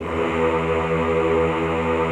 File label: EHH E1, Classical Choir; Best Service; Extended Classical Choir; EHH MIX